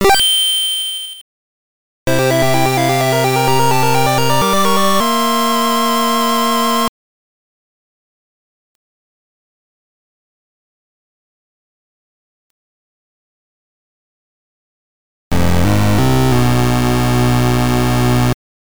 Golden Coin Get (Open Door); super mario land 2 six golden coins

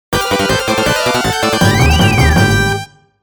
1-Up; Sonic 3 Prototype Remastered

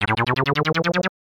Grow; super mario kart gamerip

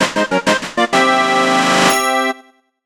Star-Catch Fanfare; super mario 64 restored 1996

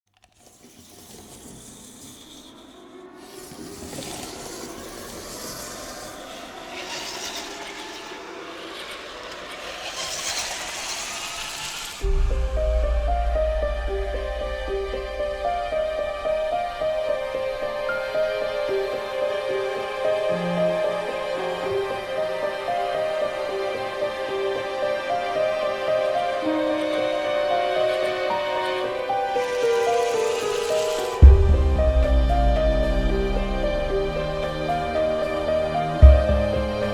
Strings; JoJo's Bizarre Adventure; STONE OCEAN O.S.T

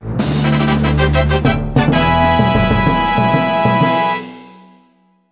Airship Clear; new super mario bros. wii

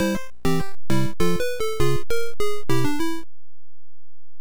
Game Over Theme; super mario bros; unused

